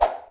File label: pop